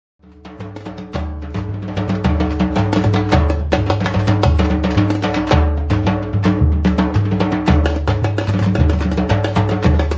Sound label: timba